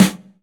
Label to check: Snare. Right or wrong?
Right